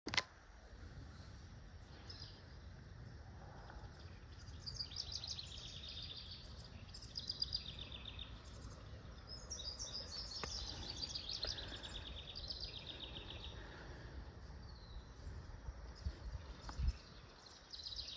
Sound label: Lebel, صدای تفنگ; تفنگ لبل